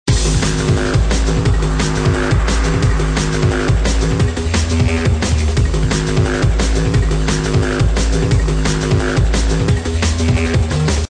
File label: Loop; Chaos Faction